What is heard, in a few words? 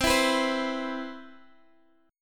C Major 7th, chord